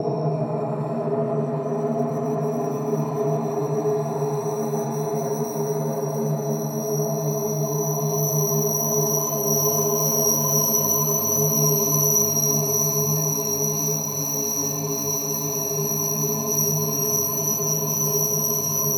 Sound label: Ambience